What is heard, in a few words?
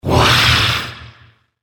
Evil Creature Attacks; Evil Sound Effects; fantasy sound effect